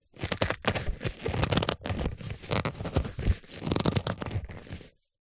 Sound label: sport soccer ball handling rubs creaks